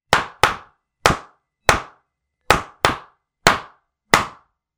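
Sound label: slipper sound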